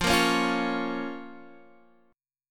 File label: F Suspended 4th Sharp 5th F, Guitar Drop D